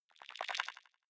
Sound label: sci-fi subterranean light up high